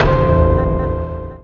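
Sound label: Chord Jazz Low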